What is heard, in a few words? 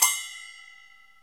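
JP-CYM, Japanese cymbal; Crypton - DrumBase5000; JAPANESE PER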